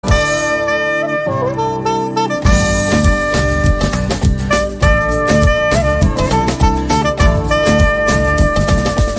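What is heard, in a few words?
Song background music Telugu Ringtone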